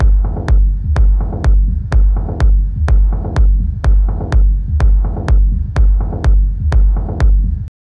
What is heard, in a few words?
big kick